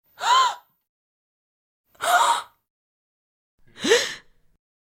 Tieng ngac nhien giong nu

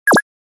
am thanh nhan nut an nut hoat hinh

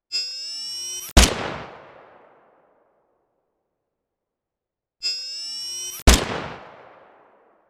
Tieng sung laze ban 1 phat

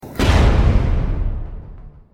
Nhac phim kinh di gay soc bat ngo

